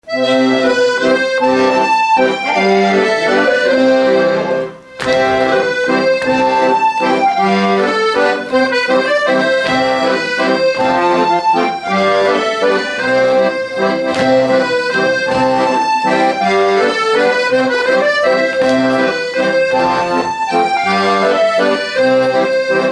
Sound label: Mazurka